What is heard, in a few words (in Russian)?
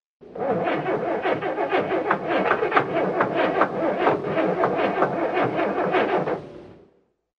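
не заводится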